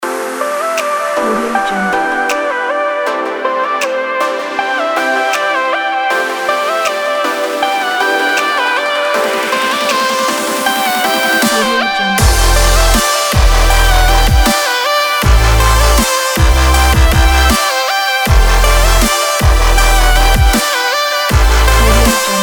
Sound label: dubstep emotion